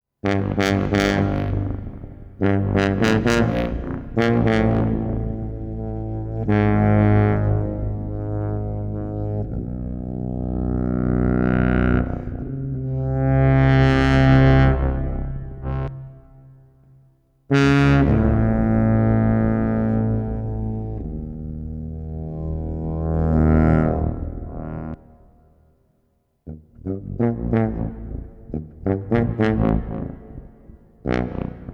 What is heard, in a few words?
Tuba Introduction; Psalm 42